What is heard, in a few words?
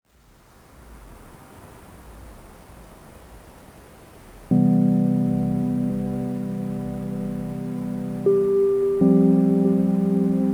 mountain stream stems; airtone